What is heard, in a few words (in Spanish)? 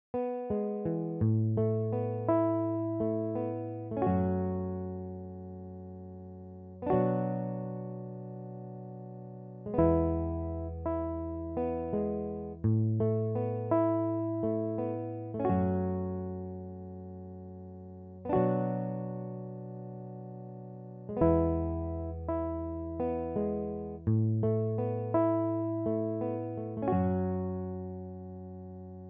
Salsa, midi